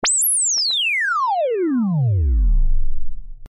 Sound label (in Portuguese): lead, tecladista